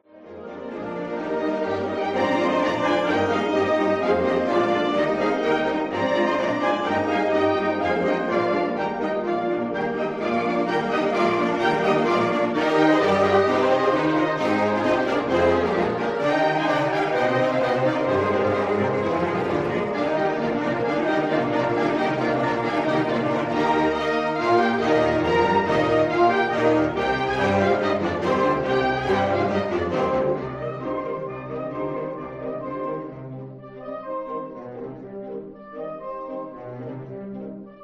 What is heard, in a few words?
Slavonic Dance saxes; DVORAK; No 7; Large Ensemble